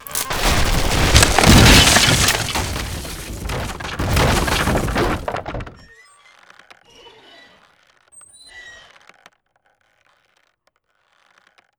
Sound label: Bridge Crumble